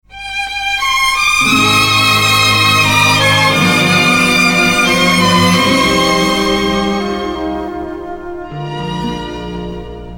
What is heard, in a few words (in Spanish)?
Mi Guitarra y mi Voz